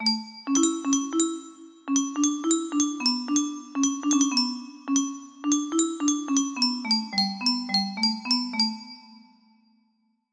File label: lyre melody